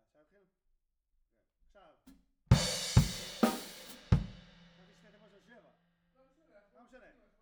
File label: Room